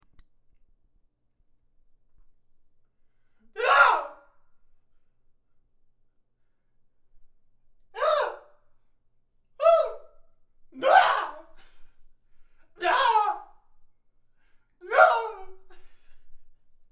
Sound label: scream; inner-demons